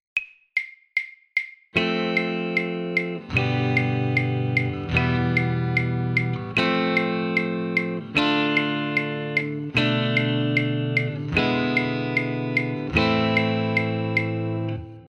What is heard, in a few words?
practice chord